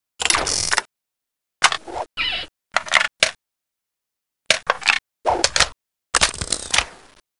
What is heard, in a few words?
reload; poke646